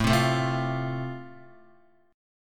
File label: A Minor Sharp 5th, Guitar; x,0,0,2,0,1; 4F,4A,5C,5G,5C,6E; strummed; JGuitar